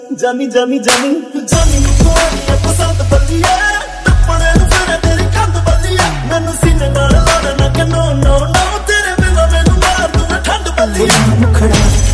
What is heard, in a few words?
punjabi song